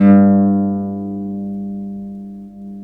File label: LUTH 2 G2, Arabian Traditions; USB Soundscan vol.43; AKAI